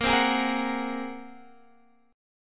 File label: A sharp 7th Suspended 2nd Sharp 5th, Guitar, Standard; strummed; JGuitar